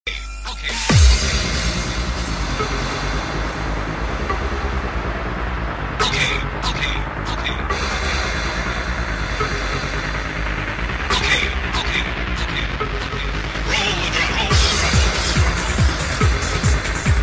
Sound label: drums